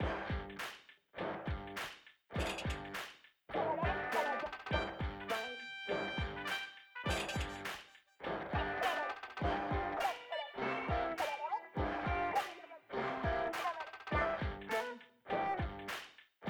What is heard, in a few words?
BGM Plaza Gear Shop